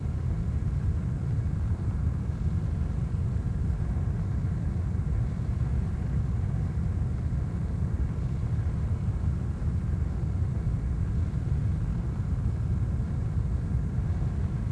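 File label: ambience smoke Stack Interior; Star Wars Obi Wan; XBOX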